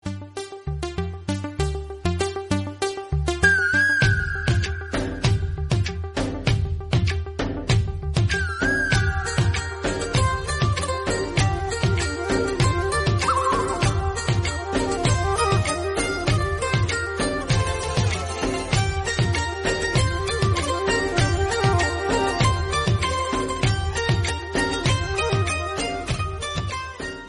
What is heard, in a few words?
Instrumental Bgm